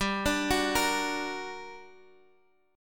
G Minor Major 7th G, Guitar, Half Step Down; GmM7

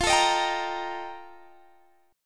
F 11th F, down, Guitar Open G

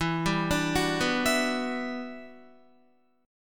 E Minor 9th, down, Guitar; Em9 arpeggiated

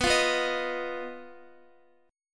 B Major Flat 5th, Guitar, 4F,4A,5C,5G,5C,6E; BMb5; strummed; x,x,11,10,11,11

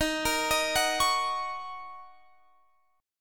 Eb Minor 7th Eb Guitar Standard; arpeggiated

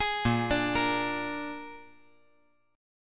A sharp 7th A sharp

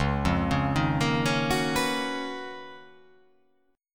C sharp 13th C sharp

